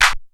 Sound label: CLAPS; CLAPS+SNIPS